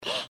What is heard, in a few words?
breath; pony; Twilight Sparkle